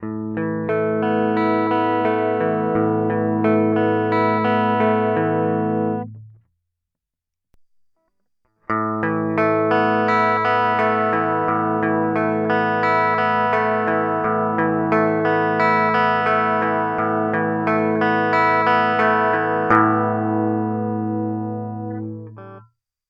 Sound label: Mooer Tone Capturer guitar Target Guitar Captured